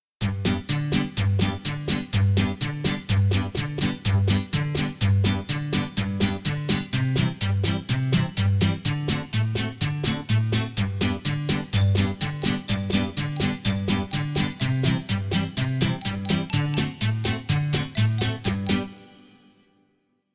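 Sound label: backing track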